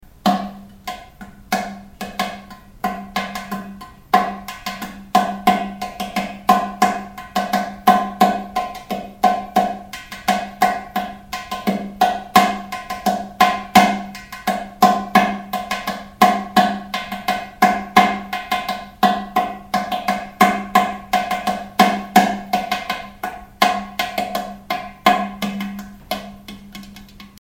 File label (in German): kleine Percussion; HDR-7